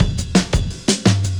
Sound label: Beat Style, 170bpm, breaks